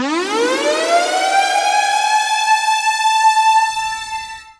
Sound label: alarm, FX & ATMO, ALARMS & ALERTS